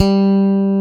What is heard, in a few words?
tite bass G4; Drums In Your FACE!